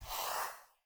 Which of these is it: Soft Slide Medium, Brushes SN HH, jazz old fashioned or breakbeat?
Soft Slide Medium